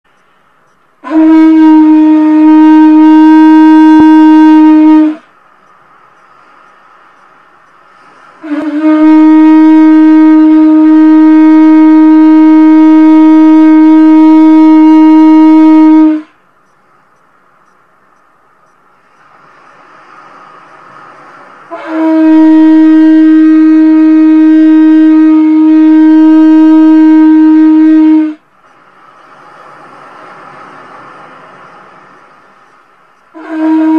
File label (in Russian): Rog tritona zvuk